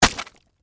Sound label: Flesh Chop Impact, Horror, Flesh, Chop, Impact; Blood and Guts; BlastWave